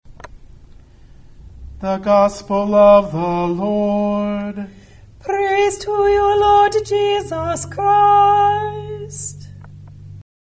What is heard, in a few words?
Gospel Closing